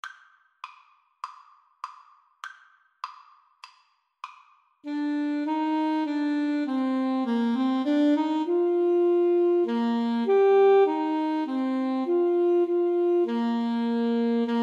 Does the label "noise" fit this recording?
No